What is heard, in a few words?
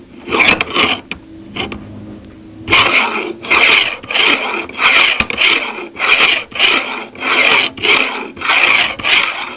Various Filing Metal